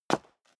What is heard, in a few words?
step high heels concrete grit single stair up; schritte; Footsteps; menschen